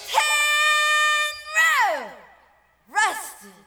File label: Tin Roof Rusted